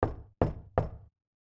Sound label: Knock 3x Faster